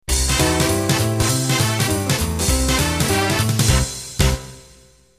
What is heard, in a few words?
New Jive end